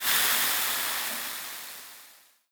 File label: Fizz